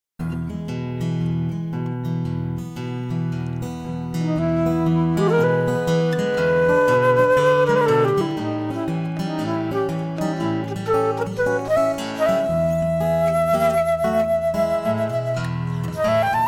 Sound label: Trailer Park Blues